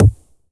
Percussion; Vengeance Samples; VEE Percussion Instruments

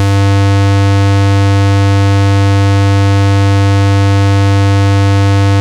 Square G2, Moog Voyager; Moog Voyager Sounds; VES1 Square

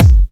Bassdrums

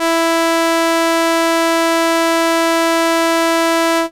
Violin E4, ARP Solina, Unmodulated; musicradar-synth-samples

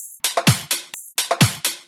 Percussive Loops 128BPM; Vengeance Samples

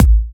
Bassdrum; Vengeance Samples

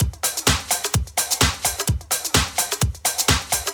House Loop, 128 BPM; Vengeance Samples

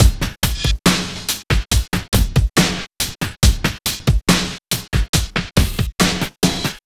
Slomo Beat 140 BPM

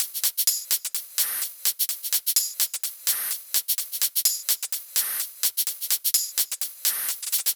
127BPM Kit; Vengeance Samples; Warhog Kit

